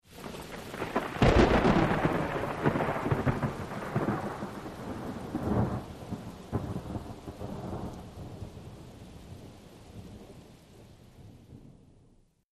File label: Rolling Thunder